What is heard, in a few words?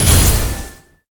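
Mech Gorilla explosion; monster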